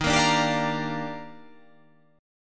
D sharp Major 9th

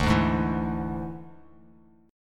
D Suspended 2nd Flat 5th D down Bass Standard; strummed

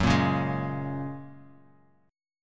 F sharp Major Add 9th F sharp; strummed; 14,13,11,13

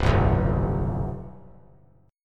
C Major 7th Suspended 4th, down; strummed

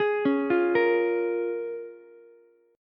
C sharp 6th C sharp down Ukulele; arpeggiated; C#6; JGuitar

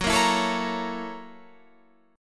F sharp 7th Sharp 9th, Guitar, Open F; F#7#9 strummed

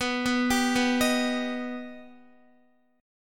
B Augmented B down Guitar; arpeggiated

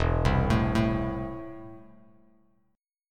E Suspended 4th Sharp 5th, down, Bass Standard; arpeggiated; JGuitar

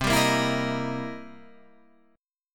C 7th Sharp 9th C Guitar Half Step Down

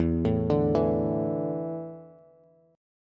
E 7th Suspended 4th, Bass; E7sus4 arpeggiated; 12,12,12,9; JGuitar

